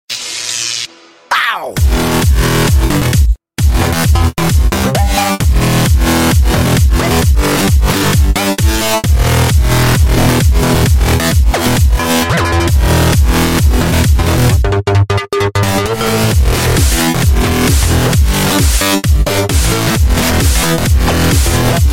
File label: Hard Mix Ringtone